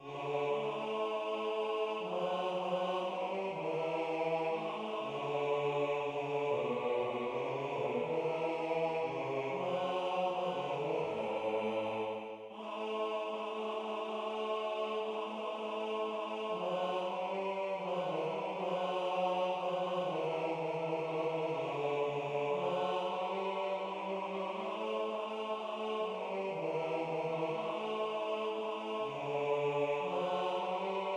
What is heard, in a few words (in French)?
La Nuit Bass